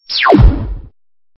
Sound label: Stinger; STINGERS